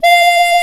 MUNDHARMO VIB; Alpine Volksmusik

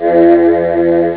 roehr; Amiga Soundtracker Sample Packs

